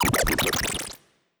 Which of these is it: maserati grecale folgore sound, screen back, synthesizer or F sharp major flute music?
synthesizer